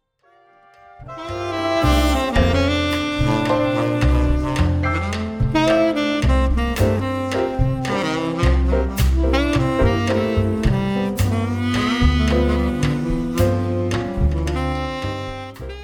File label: bamboula twin tenors